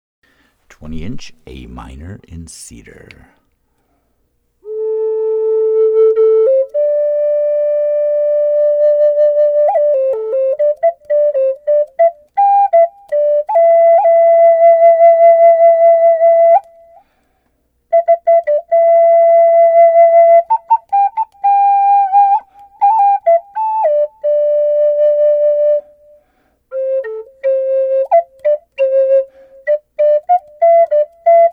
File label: 20 inch Am Cedar; Available in 432Hz or 440Hz; 5 or 6 hole